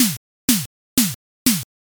footstep gravel

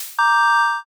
reveal

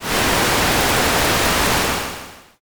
fire

